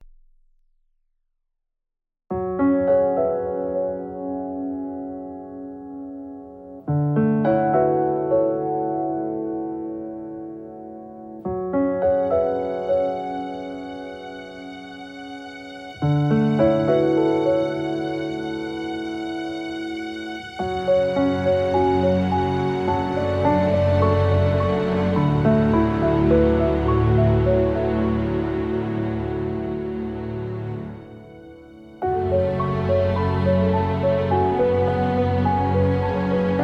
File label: instrumental